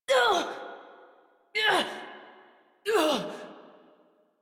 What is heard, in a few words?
Struggle Long, Adult; Warframe; New War; Adult Operator